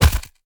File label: Collisions FS Slow; Lurker; Evolve; Gorgon